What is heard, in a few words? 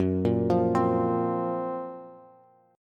Gb Major Sharp 11th, Bass Bass Standard; GbM#11; arpeggiated; JGuitar